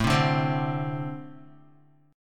A-Minor Double Flat 5th A Guitar

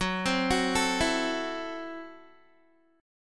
Gb Minor 7th Flat 5th, Guitar; Gbm7b5 arpeggiated; 14,15,16,14,16,x; 4E,4A,5D,5G,5C,6F

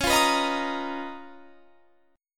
Db 13th Db; strummed

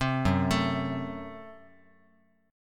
Gb Diminished Guitar; arpeggiated; JGuitar

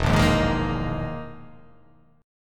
E Major 7th Flat 5th down

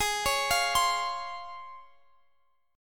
C sharp Major 7th

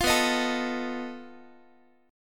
B 7th Flat 5th B down Guitar; B7b5; strummed; 20,19,18,19,x,x; 4A,4E,5A,5D,5G#,6C#

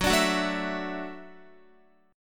Gb Minor 7th Sharp 5th, Guitar; Gbm7#5; strummed; x,9,12,11,9,11

